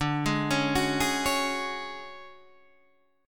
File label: D Major 7th Flat 5th D, chord